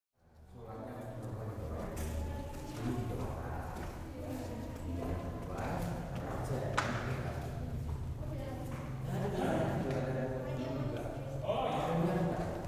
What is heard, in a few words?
Jogja circular building, INDONESIA